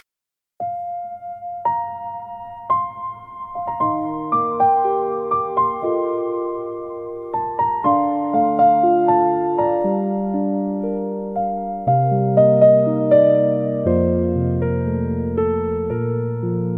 Soft Footprints in the Air, fantasy music nature forest; ghibli style; wind healing nostalgia